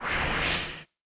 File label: drag selection